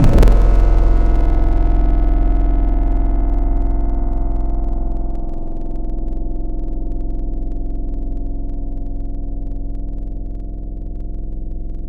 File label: Chroma, Tropical Mallets